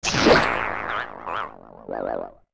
Die; Snapper dying

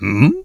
Hmm; Sir Combsly: "Hmm?"

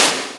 Dash Start; Sheik beginning a dash; Zelda

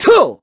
Attack; Ghirahim; zelda sounds; Skyward Sword

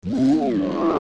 Hit; Dead Hand; zelda; OOT

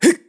Roll; Link rolling; zelda sounds; Skyward Sword

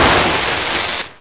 Spark Shock; A spark shocking; A spark shocking Tingle; zelda

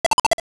Sword Switch; Hitting a sword-activated switch (also an egg rolling out of the figurine dispenser)

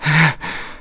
Breathe; Link

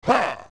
Phantom Ganon Ha; OOT

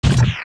Fall Down Lava; Link

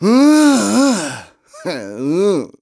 Moan; Ghirahim moaning, sick of Link; zelda sounds; Skyward Sword

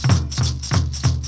Rhythm; Odolwa; Zelda; MM

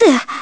Link Roll Smack Recover

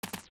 Dog Run; Zelda; OOT

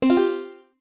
Pointer Setup Open; Open (and make selections in) the Pointer Setup

